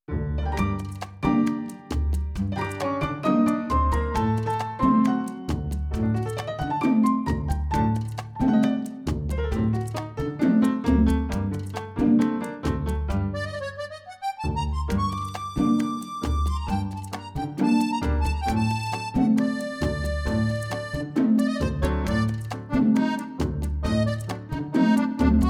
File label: Tango de la Noche